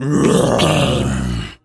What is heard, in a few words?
zombie voice grunt